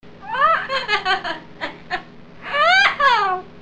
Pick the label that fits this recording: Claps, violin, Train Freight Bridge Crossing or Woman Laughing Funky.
Woman Laughing Funky